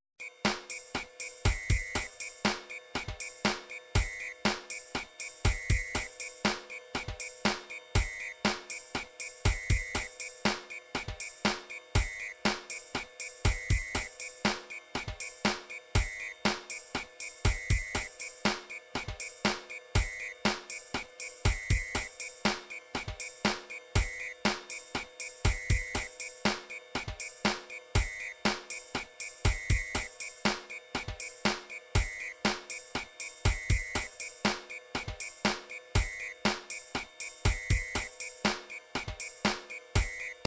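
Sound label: drums pattern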